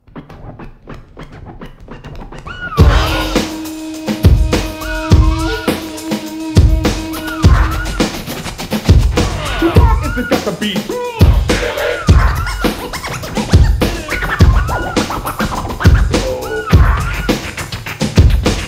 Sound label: Groove